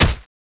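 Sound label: fist hit body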